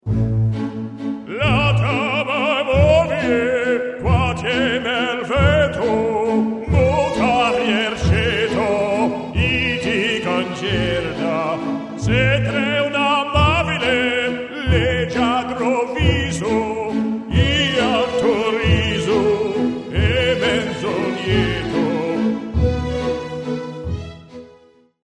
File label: Sings